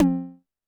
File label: TD-20 Tom A, Roland TD-20 Toms